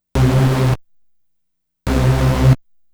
Trance Bassloop 140bpm, Bass Arps

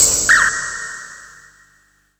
Ambi Bird; Zero-G Jungle Warfare 1, 2 & 3; Ambient

